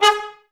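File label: brass trumpets G#; Miroslav Vitous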